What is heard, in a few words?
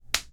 Facepalm